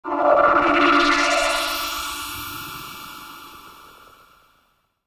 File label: Alien Com